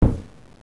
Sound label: ks drum